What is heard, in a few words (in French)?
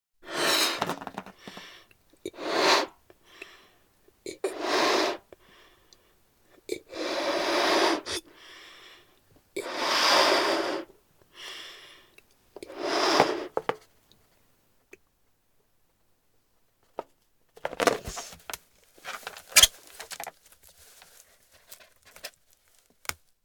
Gonfler un ballon